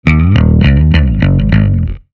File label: instruments bass; maoudamashii